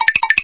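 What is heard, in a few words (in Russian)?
звон из мультика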